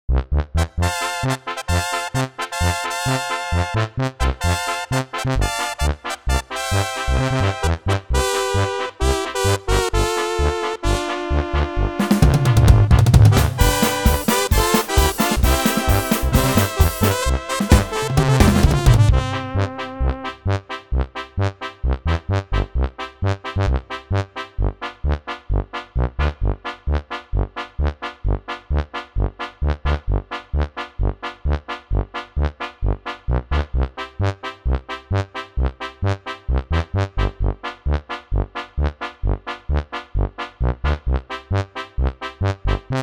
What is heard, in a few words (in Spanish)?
Banda Polka